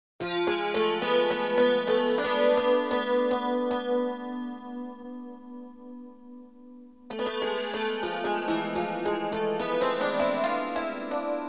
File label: Ring